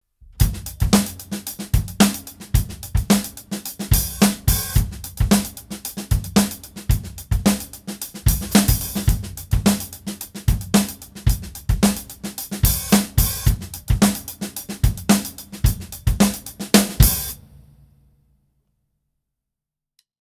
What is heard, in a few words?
Paradiddlesque Funk Groove